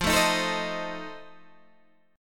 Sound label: F 7th F down Guitar Open G